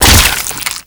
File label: Headshot; Warframe